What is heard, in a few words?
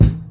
thick bass drum a key; Mono; kick drum samples